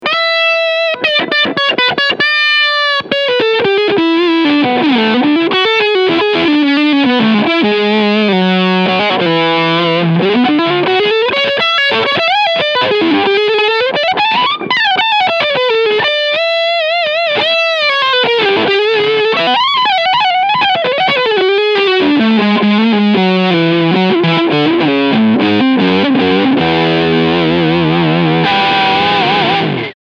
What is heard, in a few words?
Full Gain High Cut